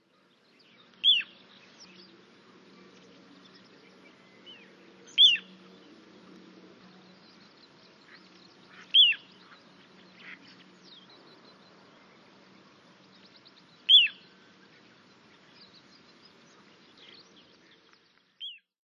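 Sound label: little ringed plover, watarase; koe; kochidori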